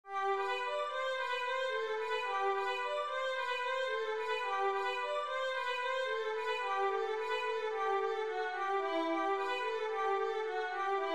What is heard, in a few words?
etude, violin